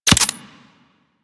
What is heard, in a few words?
Pistol Projectile Attach; Weapons; Warframe; Tenno; Guandao